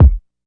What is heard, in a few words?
classic hip hop kick; Classic Hip Hop Mega Kit